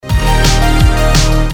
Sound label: message